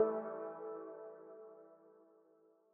112 C Synth Riff Tail; New Age Ambient Loops; Tail Samples; Spring

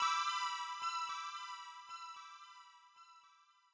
112 C Synth Melody Tail, New Age Ambient Loops; Tail Samples; Spring

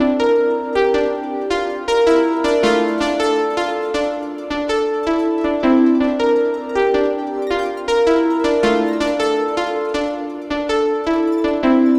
80 Dm Synth, Ambient Synth Loops; Aerosol

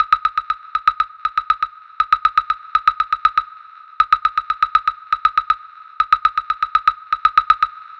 percussion woodblock fast

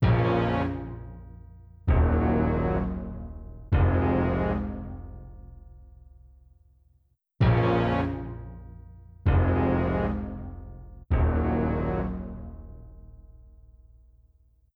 Orchestral Synth Stabs Unsettled 130

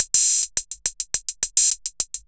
808 Loop Hats 105, Roland 808 Drum Loops, 105bpm

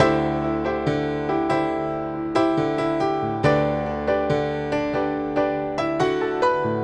Classic Rock Piano

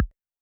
808 BD short, Roland-808 Drum Hits Bass Drum

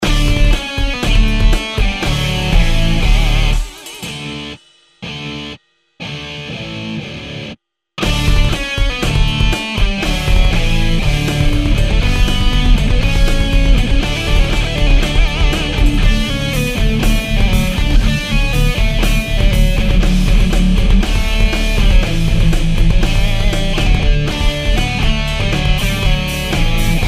Extreme Joy, Music background pads

